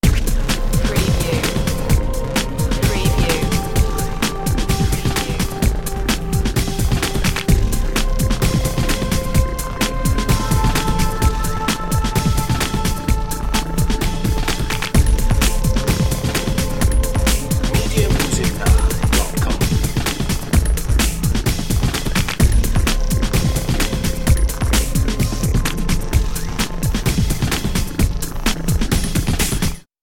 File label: disturbed